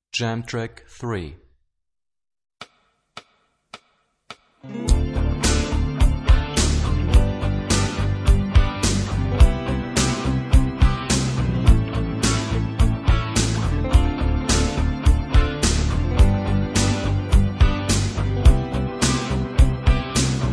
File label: Rock guitar; Rock gt Secrets